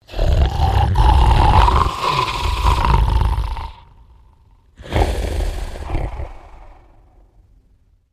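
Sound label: Character Giant Sleeping Snore; the unfinished swan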